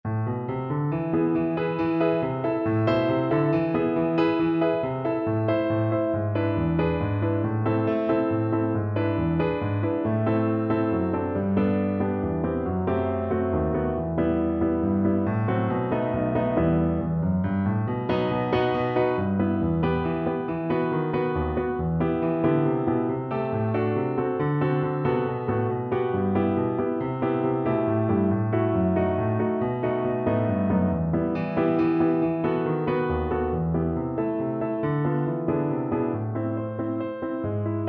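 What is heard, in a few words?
danza danza, a minor, accompaniment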